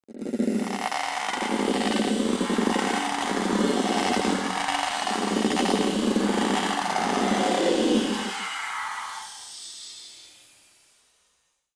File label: drums